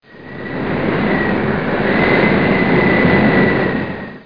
vent; Reperages 94; tortue

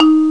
bip; MOBICLIC 14; europe

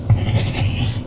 crayon; MOBICLIC 14; courrier

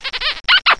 bisou

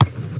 crabe; Emme; Arc En Ciel Et Le Lagon Merveilleux; French Version

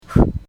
souffle; MOBICLIC 72

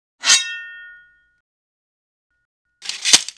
épée; El Rey Arturo

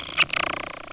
echec

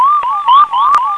Baleine; Mosaïcon

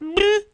RATOCRI; Mobiclic46

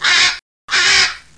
Perroquet; CD Action

